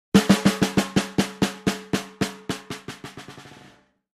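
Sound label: Drums Snare March Accent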